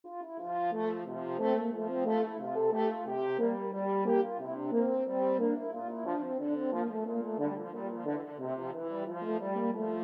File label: sailors hornpipe, french horn duet